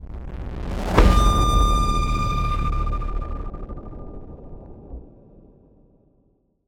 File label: Impact Boom Distorted; bottlerocket